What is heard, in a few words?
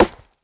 tree impact; Rust